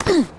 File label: Street Ball Hit Body; SFX Kit